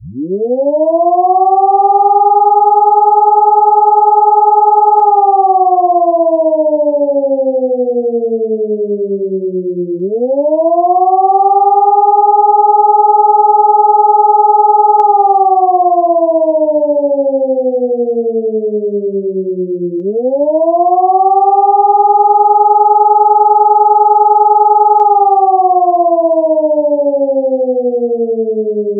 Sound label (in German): Warnung